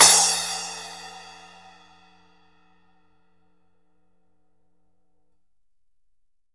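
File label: SPLASH, cymbal; Big Fish Audio; Ross Garfield Drums VOL-2; GM PERCUSSN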